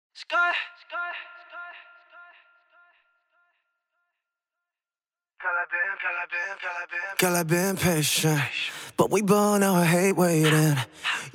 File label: SLIDE ACA 100BPM Cm